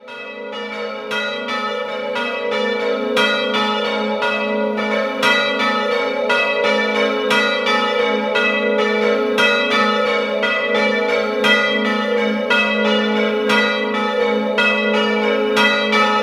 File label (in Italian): Villanova, campane